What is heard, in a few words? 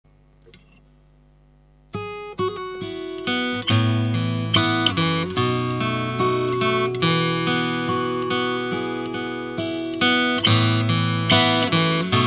martin ooo 01, guitar